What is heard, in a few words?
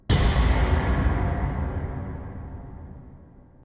Tymp